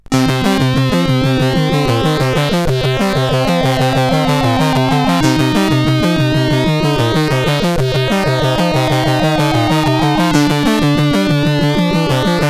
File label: Lload, CSG8580R5